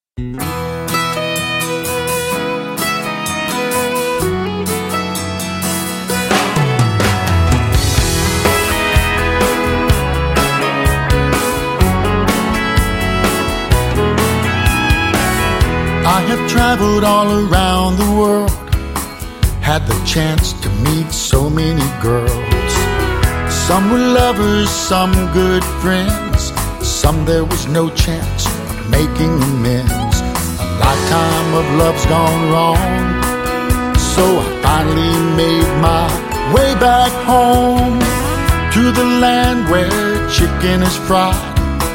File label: Tropical Country